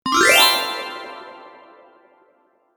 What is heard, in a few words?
kirarin